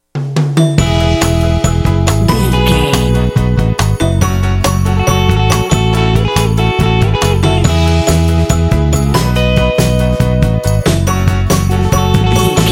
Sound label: hawaiian beach breeze jingle alt mix